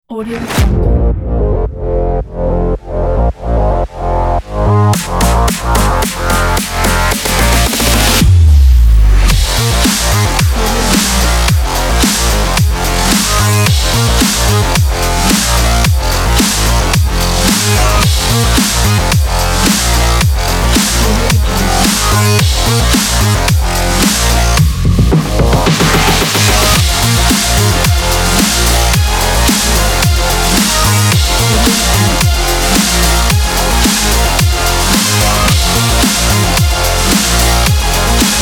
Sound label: sport action kit